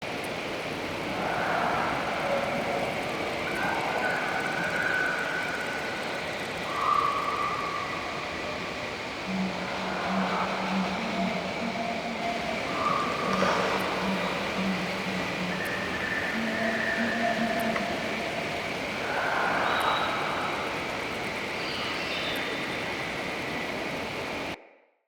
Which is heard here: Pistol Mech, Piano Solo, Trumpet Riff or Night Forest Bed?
Night Forest Bed